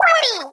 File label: Splat Woomy